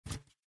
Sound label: Ramen Cup